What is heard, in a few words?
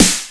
Drum